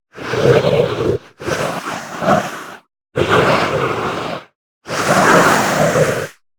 Aggressive Zombie, Monster Zombie Vocal; Coll Anderson; GDC Game Audio Bundle

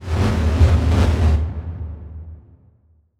Title Transition Blast Energy; Adobe; Production Element

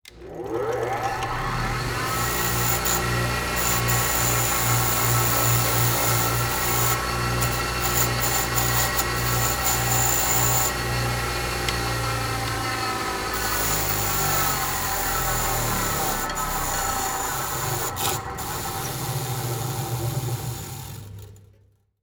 Industry Tool Grinder Run And Grind

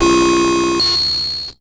Title Transition Electrical; Production Element Title Transition

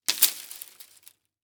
Impact Tree Branch Fall

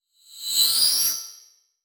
Transition Whoosh Short; Production Element

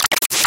production element glitch fast; Distortion Glitch; PremiumBeat

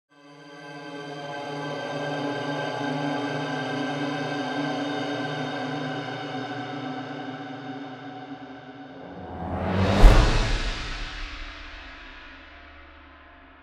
Transition; Cinematic Trailer Music; BlueFX; Bonus - FX & Transitions

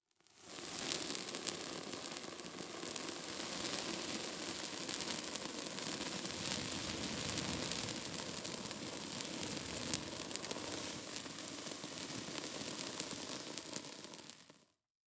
Impact Dirt Fall Slow

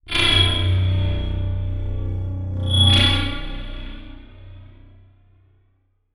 Title Transition Horror Dark; Adobe; Production Element

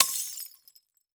Crash Glass Beer Bottle Smashing On Concrete; crashes; AdobeCC

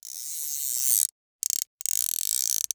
Sports Fly Fishing Reel Pull Quick

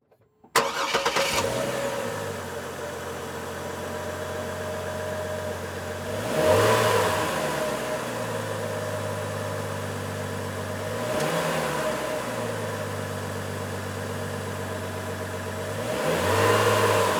Truck Envoy Start Idle Rev Off Exterior Long; transportation

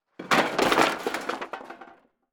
Crashes Debris Wood And Metal Hitting Cement

